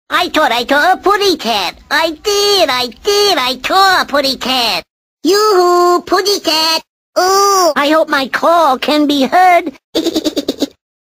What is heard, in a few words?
Tweety bird melody